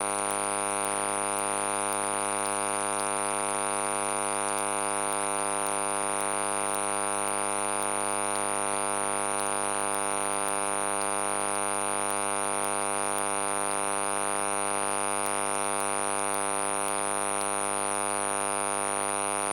Circuits Electric Hum Buzz Beeps; ShapingWaves

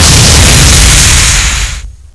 explosion steam; hl2; impact

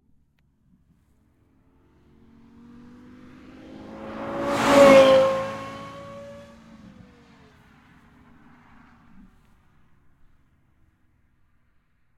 Lexus LFA - EXT - passby fast high rpm, 4.8 V10; stereo XY; Soundholder

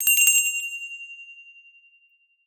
Bell Waiter; Soundopolis; Percussion

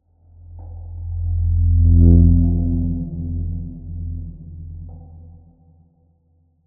Cinematic Metallic Riser, Trailer, Designed Groan; Jake Fielding; Haunted Metal Vol.2; Cinematic Creaks & Risers

